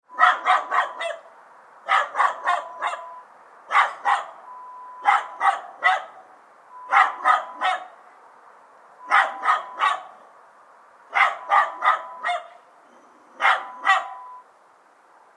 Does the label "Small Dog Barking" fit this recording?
Yes